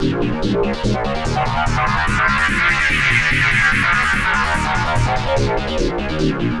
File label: LOWDOWN, arpeggios; ILIO; Trance Fusion